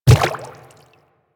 Quadruped Medium Walk Water, footsteps

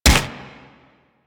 Swarm Rifle Shot; Warframe; Infested; Shock Swarm Rifle

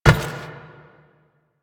Step Metal, Footsteps; Warframe; Teshin

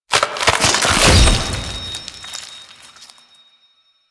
Finisher Rip Gem; Nira; Warframe; The New War; Archon

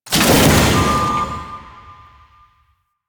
Crew Ship Debris Release A; Warframe; Corpus Railjack; Crew Ships

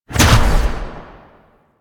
Fire; Warframe; Tenno; Grimoire

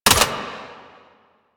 Sniper Mech React; DE; Warframe; Warframes; Frumentarius; ExaltedSniper

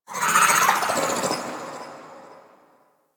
Sentient Lair Exterior Creature; Ambience; Creatures; Misc Creatures; Warframe

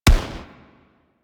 Arch Long Rifle Shot, Weapons; Warframe; Archwing

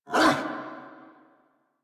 locker open bark single, Kubrow; Enemies; Warframe; Tame

